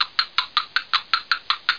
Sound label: Grassy Square Walk